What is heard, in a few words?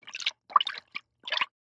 water splash; soundscrate